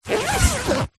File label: tent in bag zipper